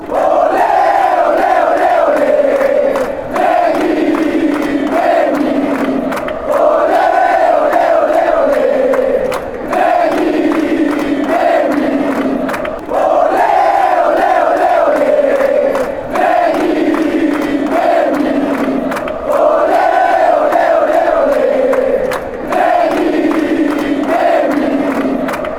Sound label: atlctico nacional chant